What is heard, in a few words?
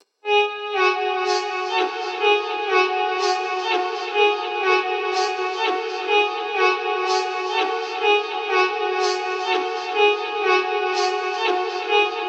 Bass 124bpm F house retro